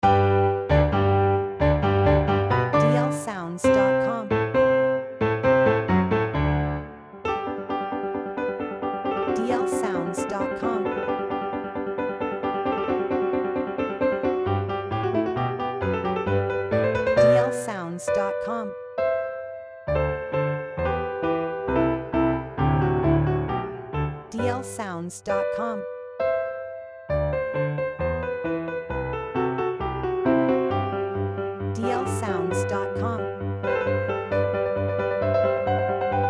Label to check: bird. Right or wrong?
Wrong